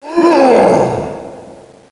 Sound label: Voices - PAIN; Tchernobog